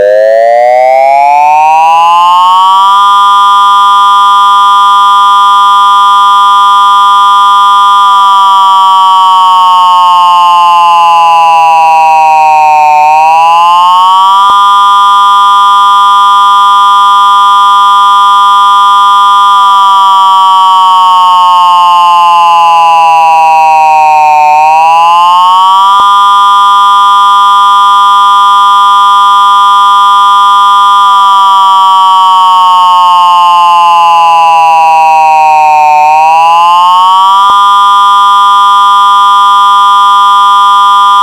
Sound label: Wail - Attack